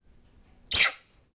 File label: monkeys various single chirpy squeals